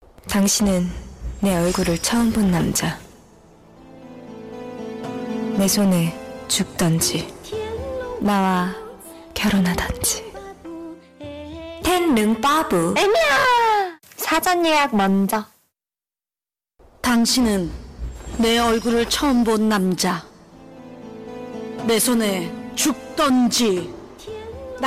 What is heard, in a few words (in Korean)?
중국여 광고 노래; 천룡팔부광고